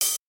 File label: ride